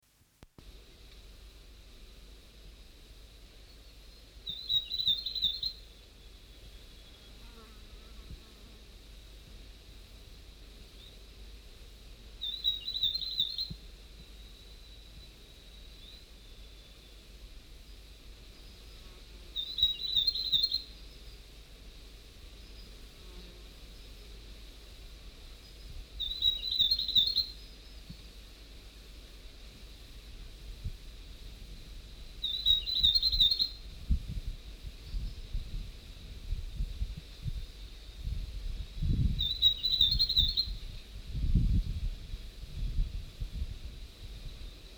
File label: north island tomtit song; conservation, native animals, birds